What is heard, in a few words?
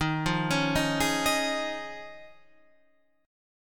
D sharp 7th Suspended 2nd Suspended 4th D sharp, Guitar, Standard; 11,8,11,8,9,11; arpeggiated; capo=8